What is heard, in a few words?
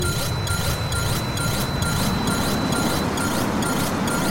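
Missile Lock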